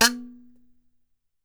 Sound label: KALIMBA, African Melodic; E-MU Producer Series Vol. 7 – Old World Instruments